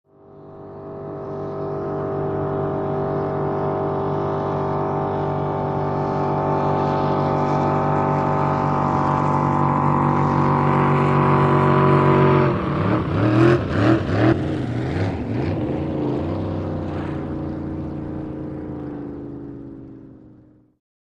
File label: Drag Boat By Slow By